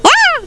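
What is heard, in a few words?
BROOM FALL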